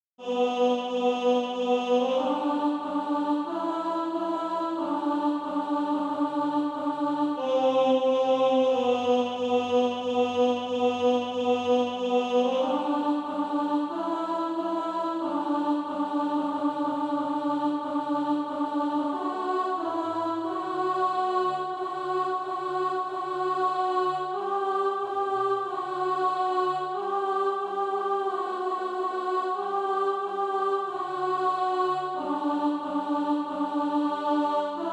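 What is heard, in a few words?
alto, Sheet music (SATB) including Practise Music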